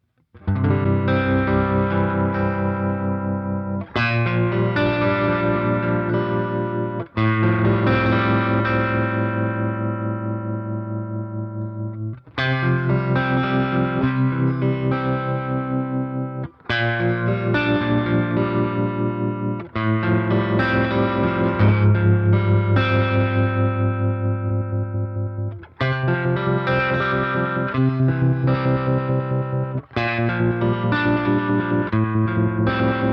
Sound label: Fuzz; Terrain-Deluxe